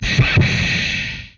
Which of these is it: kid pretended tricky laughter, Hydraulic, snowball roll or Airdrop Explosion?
Hydraulic